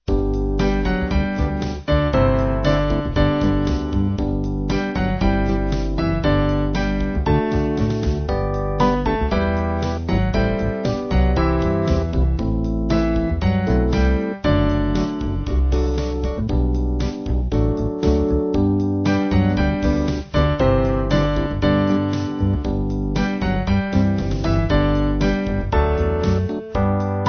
Band

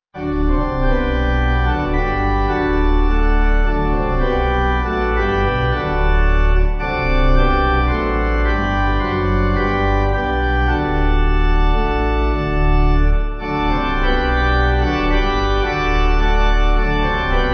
Pipe; All Glory To Jesus; Ridgemoor

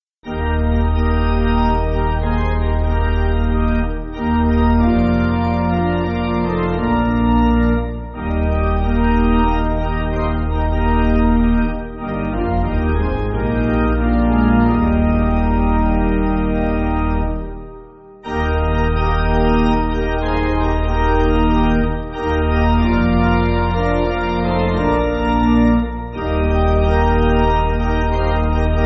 Pipe Organ, Backing Files for Churches

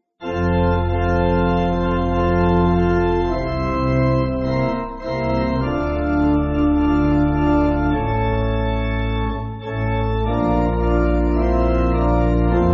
Pipe, church music; Sankey

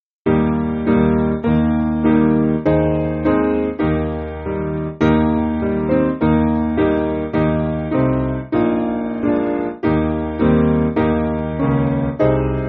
Piano

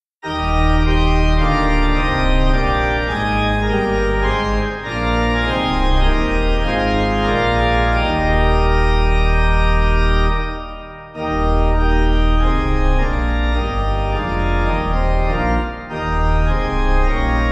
Father Of Peace, Caithness, Pipe

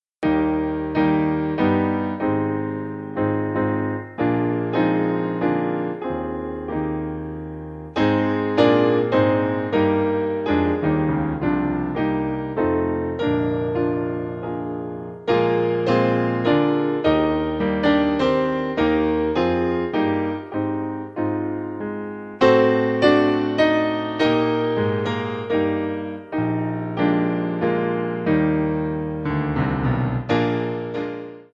Piano

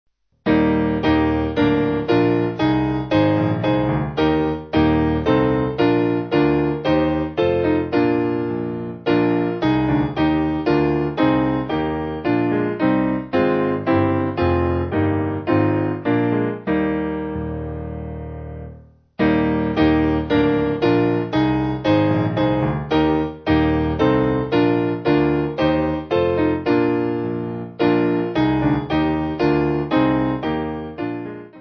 Piano

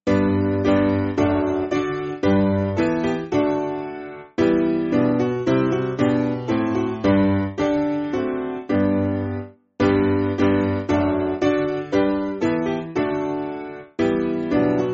Piano, church music

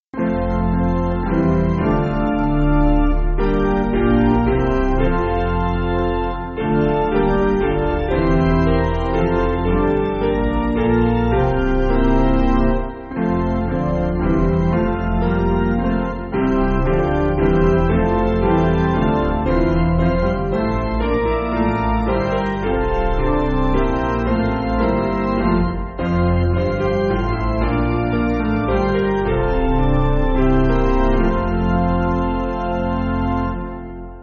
Piano

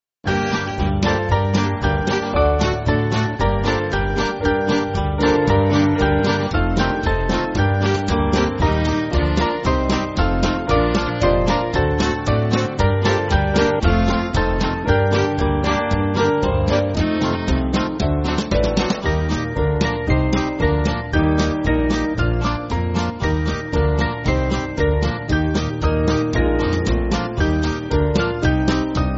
Band

